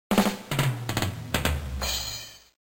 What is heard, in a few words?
Drum